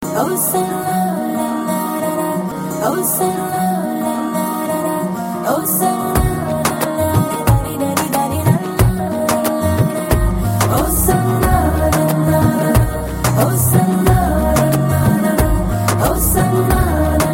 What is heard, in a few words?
humming